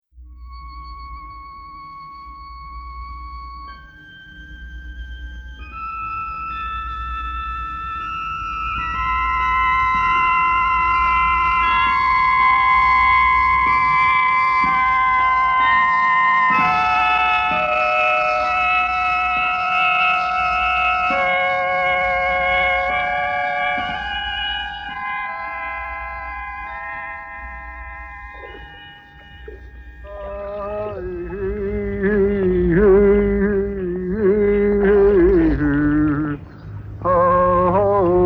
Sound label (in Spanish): mexico electroacustico